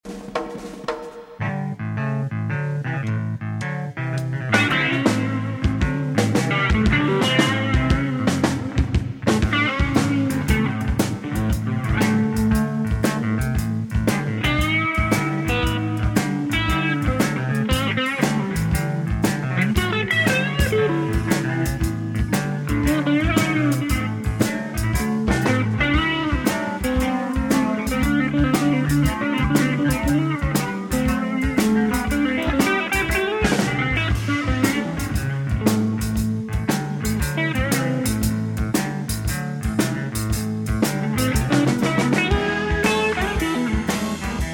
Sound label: Blues